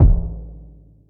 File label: big kick drum sound a key; kick drum samples